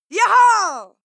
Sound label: female fight attack shout triumphal